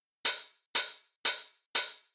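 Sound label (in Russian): open hat, Ритмическая фигура открытого «хэта» 1; Открытый «хэт» традиционно ставится посередине между сильными долями, т.е. по слабым долям; цимбал; реверсивный цимбал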